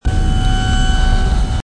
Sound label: canon loading; Predator Mod